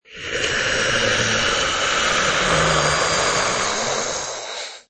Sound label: Monster Animal Sneer; Threatening, menacing snarling sneer or growl from monster, fantasy creature, werewolf or horror animal; Lynne Music